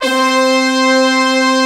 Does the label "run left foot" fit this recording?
No